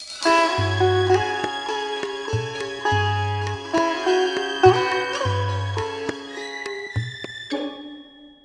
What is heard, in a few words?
Bari Sax